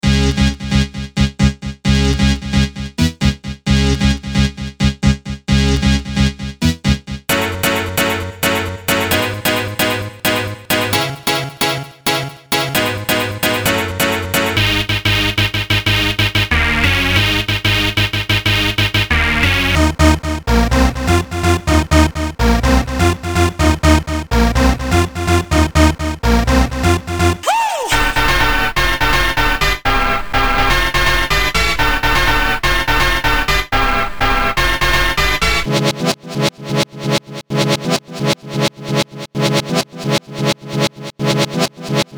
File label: rave generator